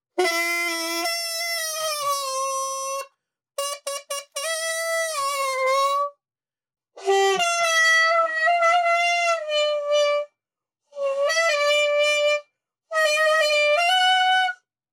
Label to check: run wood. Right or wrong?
Wrong